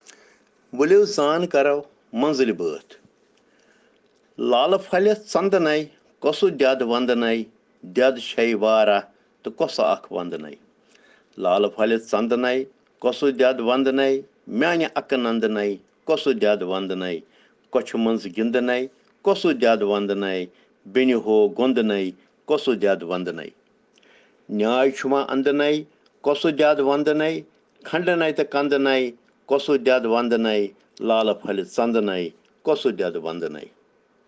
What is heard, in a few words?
Children Rhyms in Kashmiri